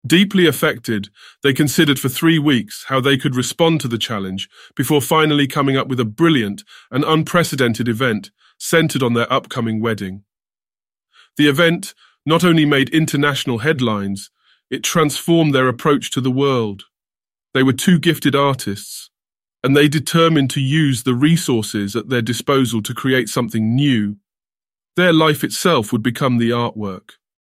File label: synthesized audio